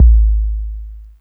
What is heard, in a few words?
BD, Drum & Bass, 150 BPM; Ueberschall; Drum & Bass Resonance